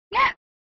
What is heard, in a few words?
Fighting Shout; SNES